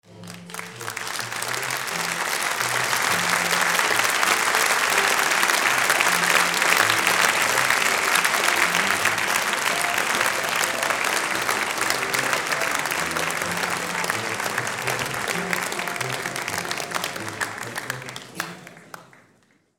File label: applause theatre premiere